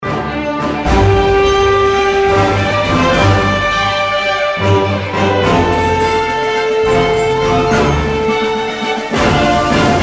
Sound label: movie orchestra remix